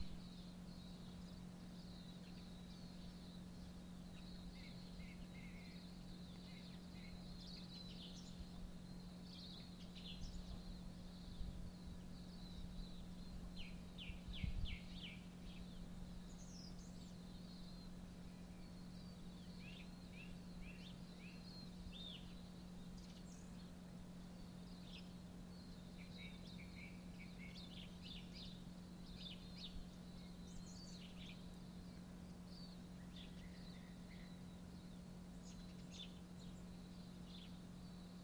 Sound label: heat pump converter chirps